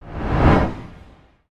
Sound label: Medium powerful whoosh transition